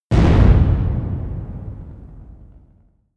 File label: shot impact; hits explosionen; metall